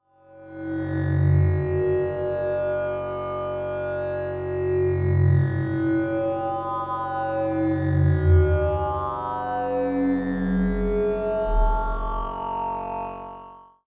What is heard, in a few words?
floating metal dry